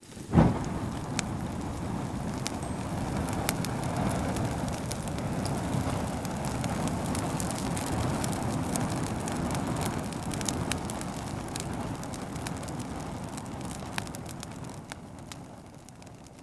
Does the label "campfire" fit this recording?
Yes